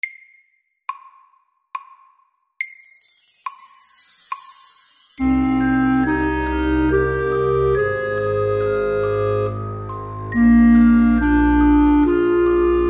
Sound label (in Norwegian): Vinterblader SLOW 70